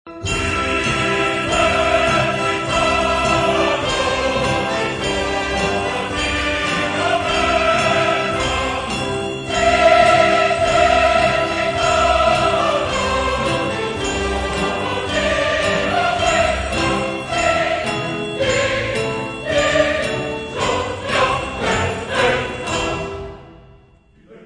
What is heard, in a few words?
verdi anvil chorus